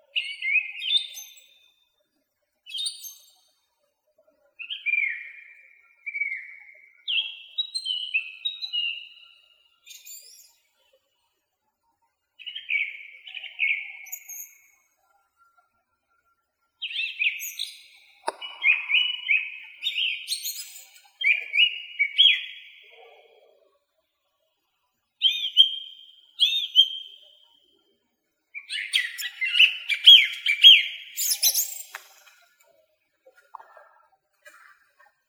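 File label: song thrush, turdus philomelos; drozd pevchii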